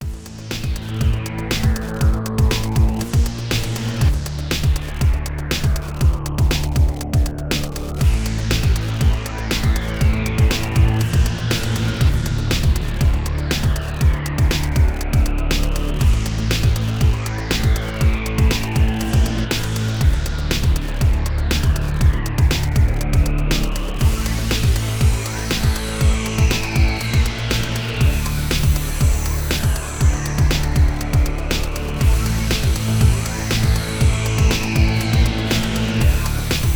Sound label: Rockin Monsters Game Menu Looping